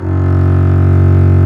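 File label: STRINGS, Cb Bowed f; SOLO SECTION; Roland - LA Composer vol. 1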